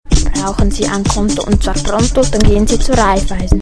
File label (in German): Jingle; Reifeisen